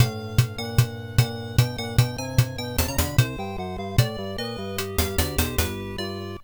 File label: Game Start; Penguin Land; Master System